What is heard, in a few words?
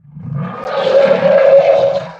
sucker growl, creature; stalker